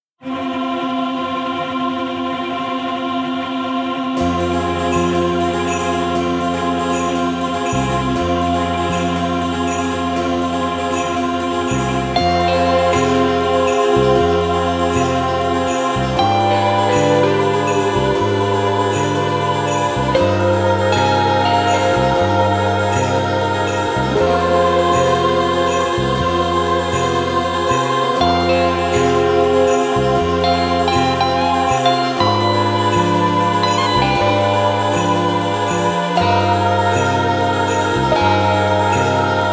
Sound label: Suspense